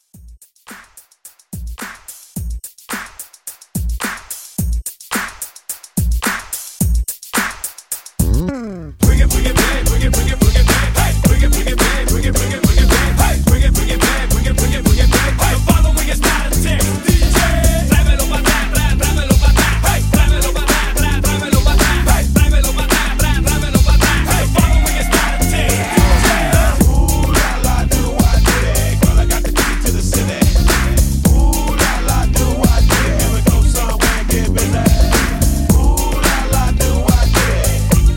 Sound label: 90s Old School Hype Re Drum 108